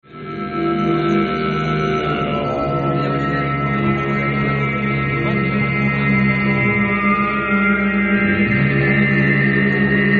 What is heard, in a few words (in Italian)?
armonici, didjeromani